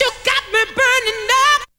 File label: VOC